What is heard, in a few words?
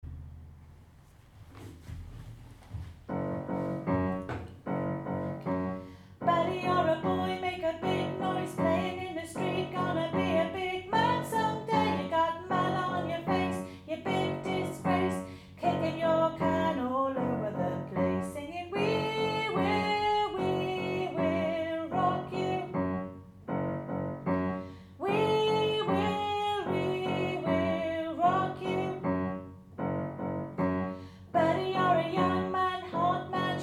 voice